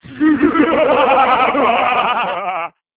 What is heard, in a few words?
madlaugh; human